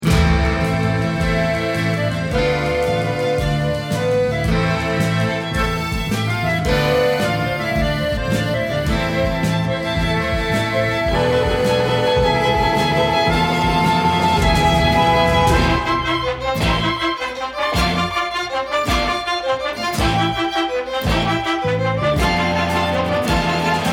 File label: bomb planted